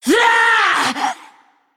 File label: Female Naga Death